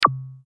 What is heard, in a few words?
volume Sound